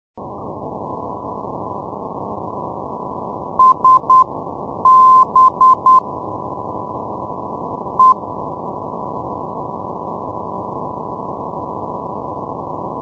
ndb selardalur

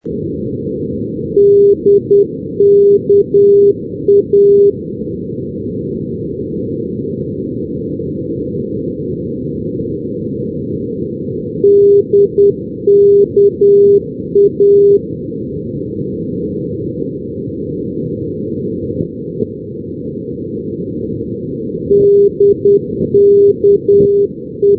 ndb dhekelia dka 343

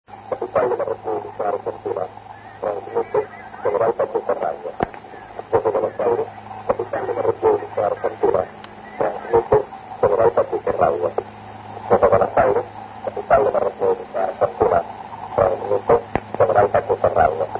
general pacheco radio

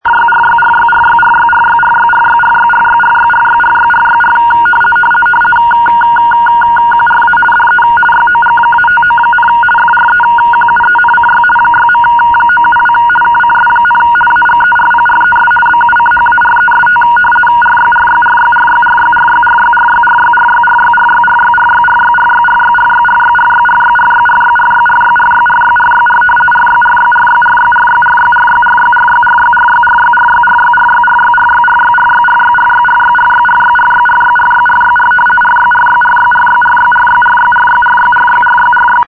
rtty 50 bd; agerpres bucharest

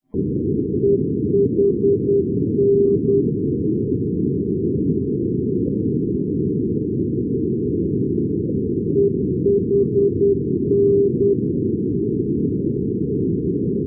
ndb eindhoven; kamen

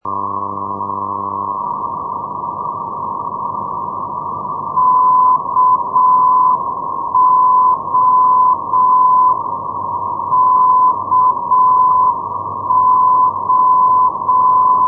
ndb koshany; europe; net-moskva

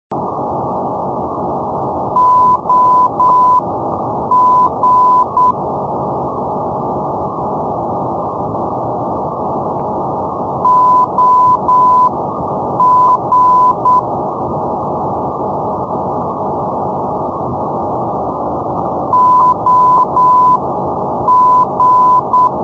ndb oegur

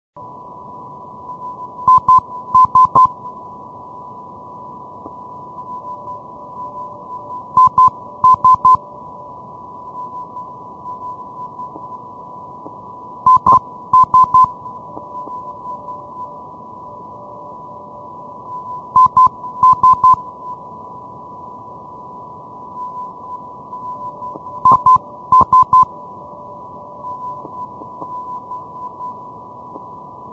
ndb isafjordhur

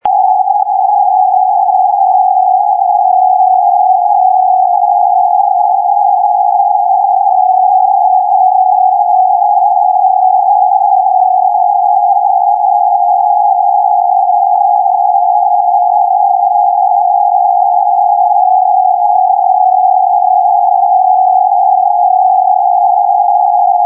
ndb prerov; europe

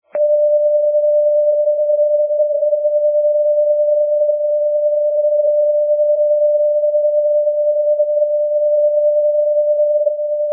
ndb figari fa 325; europe

